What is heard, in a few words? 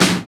SD, KIT Real-Kit; 90 s Sample CDs; Sound & Vision - Gigapack I CD 1 (Roland); Real-Kit s10